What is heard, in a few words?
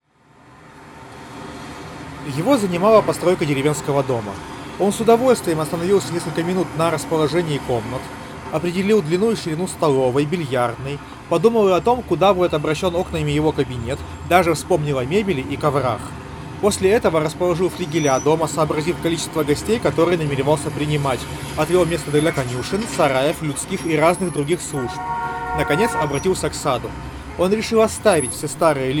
Recorder noise; sven e-310b e-315b